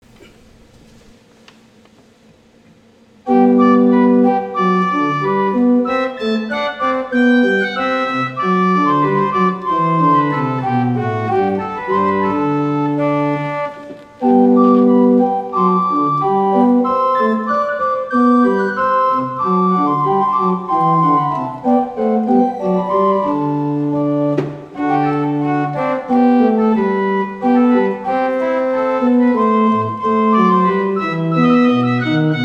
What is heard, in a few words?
Allegretto, organs; StRupert; Purcell